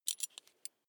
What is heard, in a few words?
metal belt buckle clatter soft